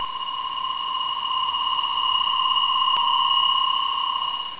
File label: Lazer; 2000 Sound Effects